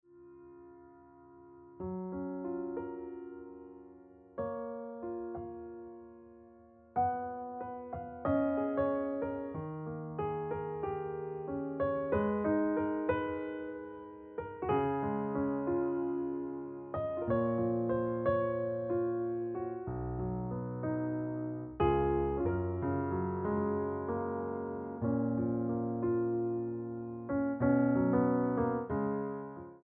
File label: Piano Music